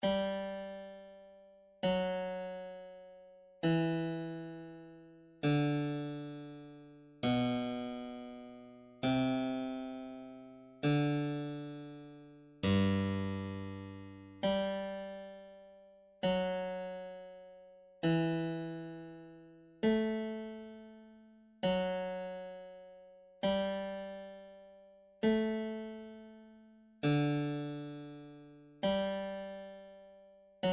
basse continue varia, clavecin

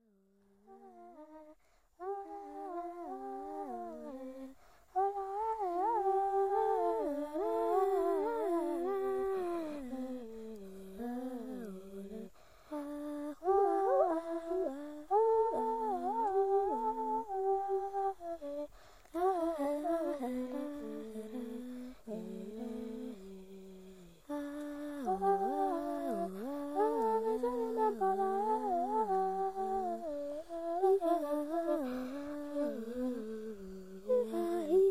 pygmee 2 voix; afrique

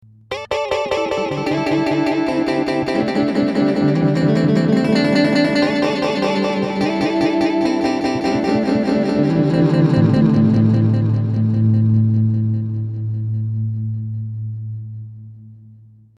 delay rapide, effets

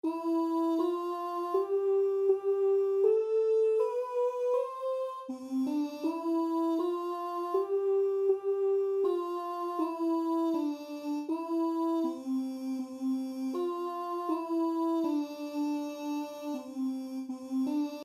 VOIX

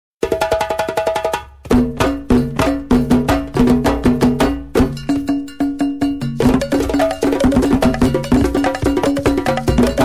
djembe batterie; afrique

